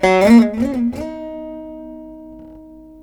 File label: VEENA; Spectrasonics; Heart of Asia